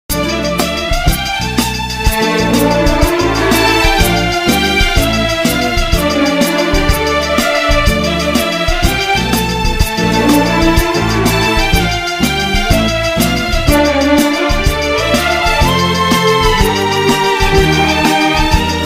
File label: flute tamil melody